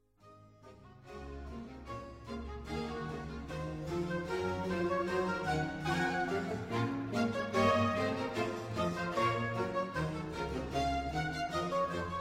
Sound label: Bach Orchestersuite 3 BWV 1068 Gavottes